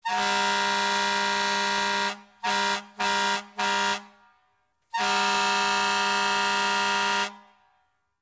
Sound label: Fire Truck Air Horn